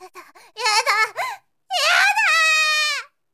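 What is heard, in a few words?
girl scream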